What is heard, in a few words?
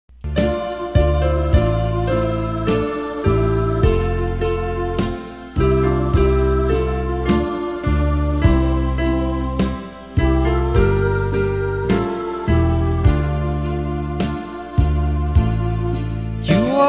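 soprano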